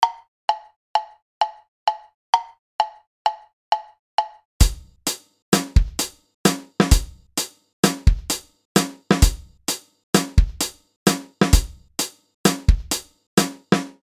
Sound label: 2 on 5 drums